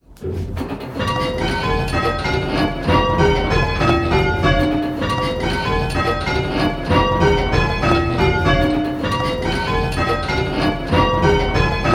Striking 6-Bell Call Changes; 132546 (King's); Pebworth

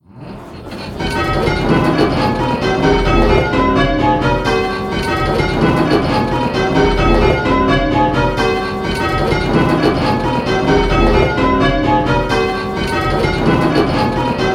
Striking 8-Bell Call Changes, bells; Pebworth

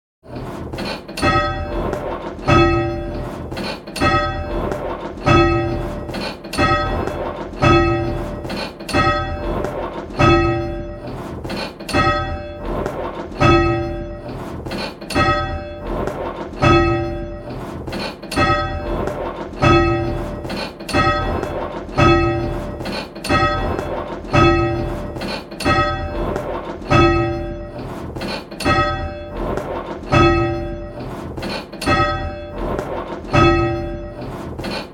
bells, 6th; Just One Bell; Pebworth

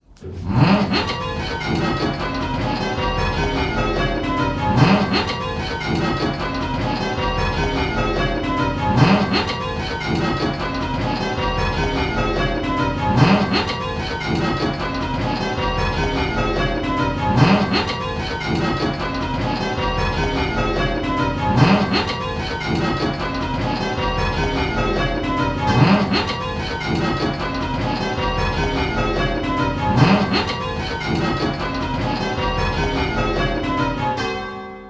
10 bell rounds; Pebworth

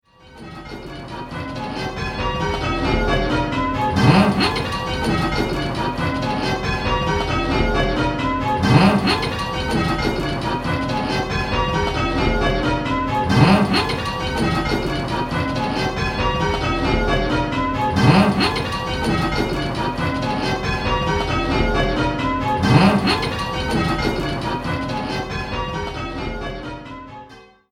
Call Change 10-Bell, Bells

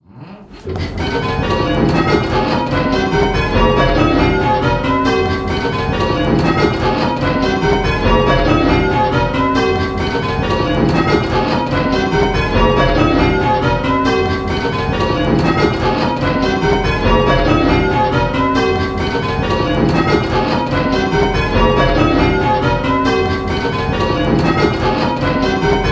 Striking 10-Bell Call Changes, Roller Coaster; Pebworth

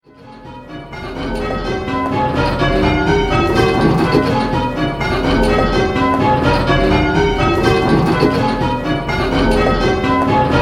8-Bell, bells; What place is the treble in?

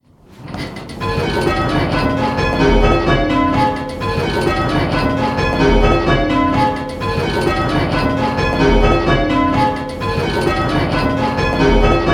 Penta, bells

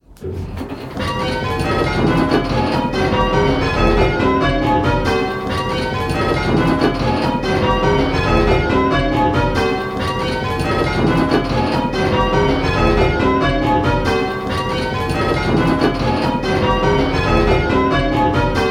Striking 10-Bell Call Changes, Kings; Pebworth

